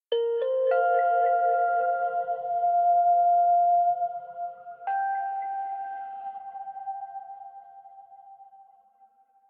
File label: Tension Fill